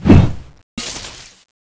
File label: storm giant mid slash fail